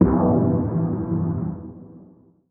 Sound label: note banjaxed chord; Polyend; Recue; melodic